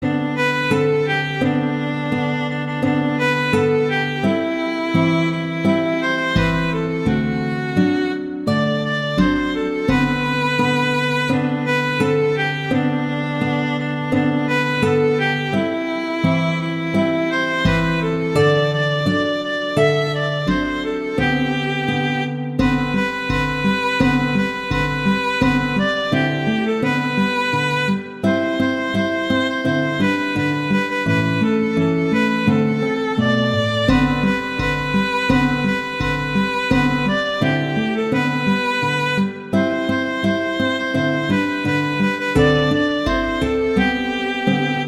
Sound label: Jingle Bells, Christmas